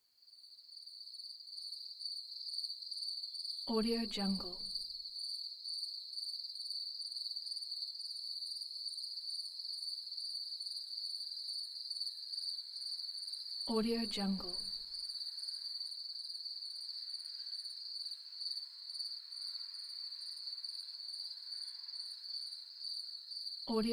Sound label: crickets